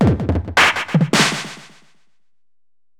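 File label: percussion, ambient, 160; eJay; Drum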